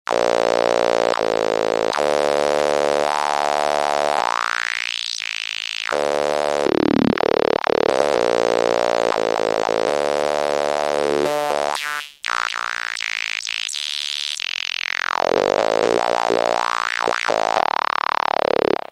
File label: hyper hi pass; Roland M-DC1